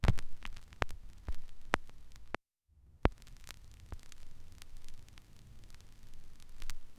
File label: vinyl record sound placin